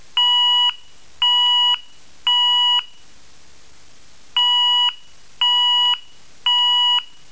Tone Intermittent 970 Hz at 3 x 500ms Pulses, 1.5s Silence, Repeat